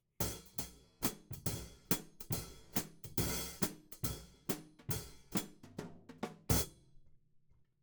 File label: Hat